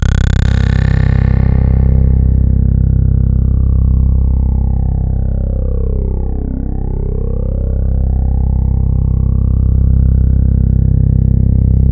C1, Roland JV 2080 TECHNO PAD

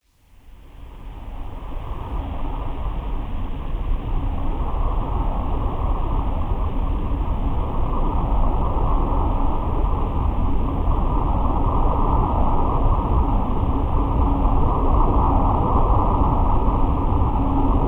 DEEP ATMOSPHERICS; AT THE EARTH S CORE; Sonic Foundry (Sony Creative Software); Vir Unis Infusion

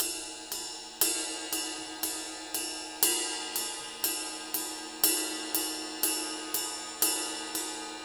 Ballad Loop, Drum, 70s Ballad; Drum Tools

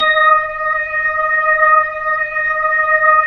B-3, Jazz

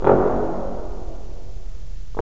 BRS, Roland LA Composer

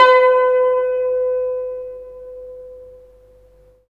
SITUR B4; Spectrasonics; Heart of Asia

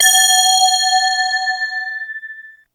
SYNCLAVIER; DIGI2 FM+SMP

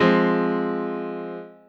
Piano OS; Rondo Brothers Trip-Hop Loops & Samples

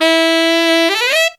TENOR FX; Zero-G; Phantom Horns

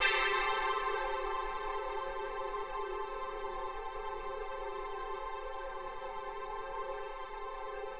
120 Pad A, Keys, Nu Jazz House; PowerFX

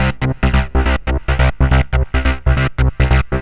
Trance Bassloop 140bpm, Bass Arps

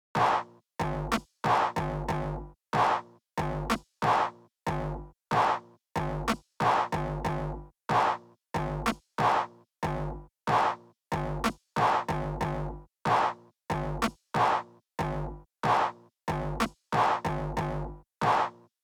dirty loop B, Drum Tracks, 093 Cmin

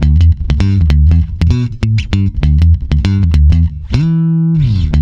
E, swing mute, bass; Spectrasonics; Bass Legends

